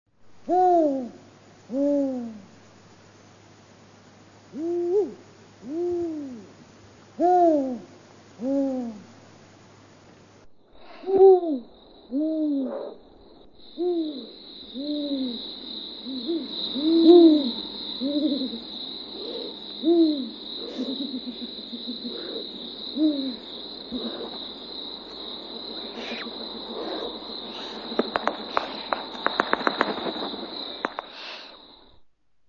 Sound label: Spotted Eagle Owl